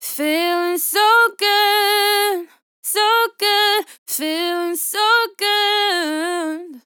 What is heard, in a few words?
LYRICS Fills 120bpm Fm